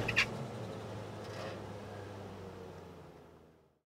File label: Truck Stop Exit Sound Effect; Phasmophobia